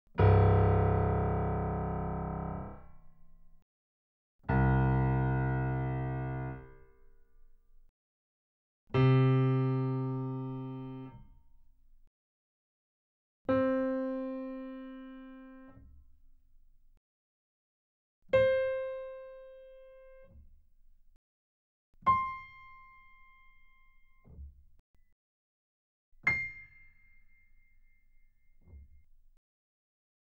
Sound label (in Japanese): Pf, 打弦; C1～C8; 弦鳴楽器